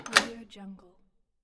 old window large bolt locking mechanism

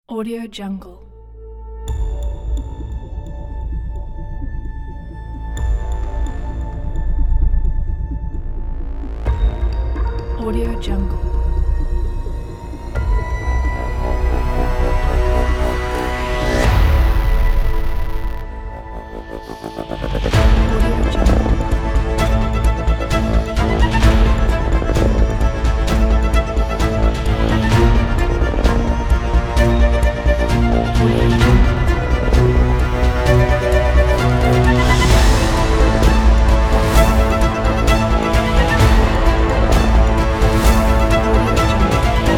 hybrid action intro

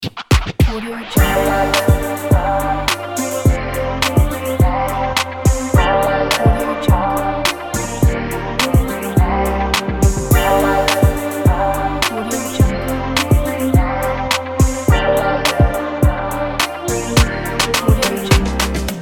podcast trap opener

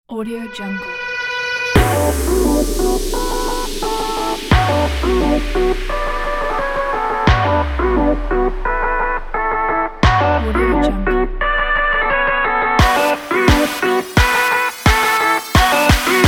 a future bass

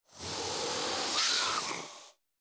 creature vocal spider sucking hiss

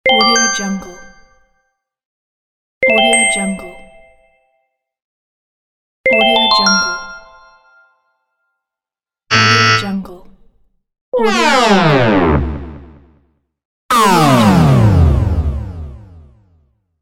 correct and incorrect answer

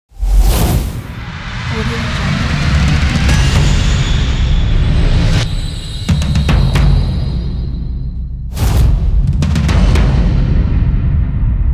cinematic horror trailer; kipaloops

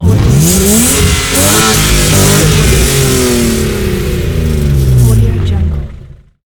rally car high revving; debsound

